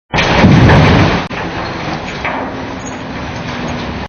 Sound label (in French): fond déchets; Collection Synesthésie; Isabelle Grosse